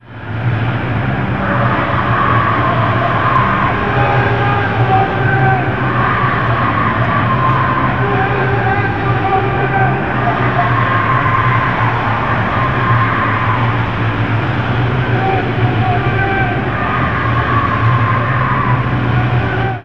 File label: ouaaaah